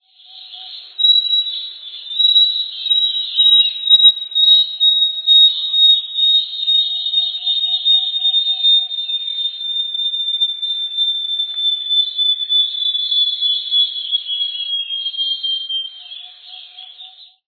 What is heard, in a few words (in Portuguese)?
uirapuru-de-asa-branca